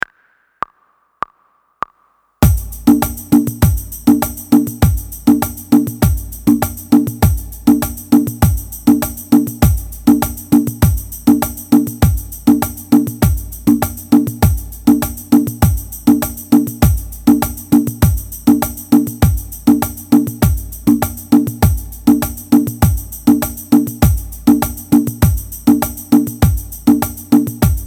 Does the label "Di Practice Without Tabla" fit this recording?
Yes